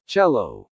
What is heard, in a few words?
cello